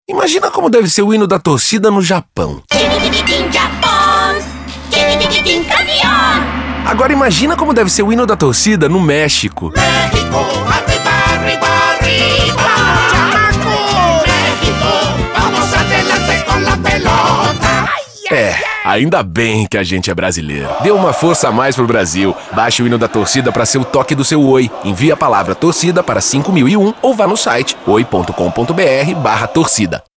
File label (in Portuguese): Hino das torcidas